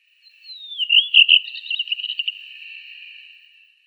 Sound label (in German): Rostschwanzdrossel Turdus naumanni